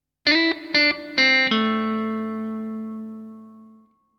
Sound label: Lead Guitar Lick